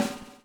BUBL; Spectrasonics - Backbeat

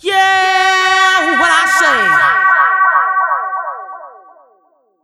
SPACECHO, Funky Vocals, SHOUTS; USB Soundscan vol.18

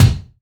BD, BASS DRUMS; EdgeSounds

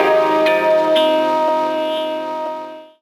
PAD GTR, Gbm; Rawcutz - Nu Forms

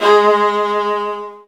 Virtuoso Strings

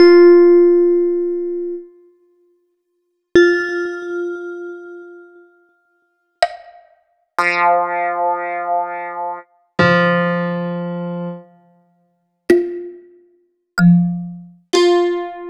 leads

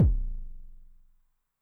Bass Drum Pitch LOW DROP, 909; classic machines; BD - BASS DRUM

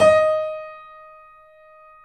Small Pianos, 3-D Audio Collection; E-MU Producer Series Vol. 5; 3D Multis

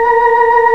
Organ, Barton Tibias

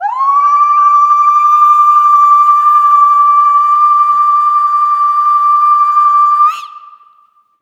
BERBER, FEM. NOISE; Heart of Africa; Spectrasonics; Heart of Africa 1

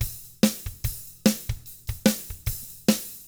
ROCK; ILIO; Double Platinum Drums 1

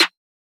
chop snare

